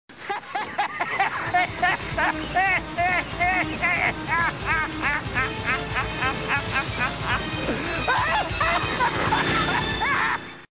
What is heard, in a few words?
boss lol long